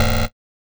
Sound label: Glitch Sound